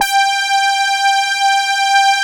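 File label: MOOG MODULAR G4; Hollywood Edge - Giorgio Moroder Rare Synthesizer Collection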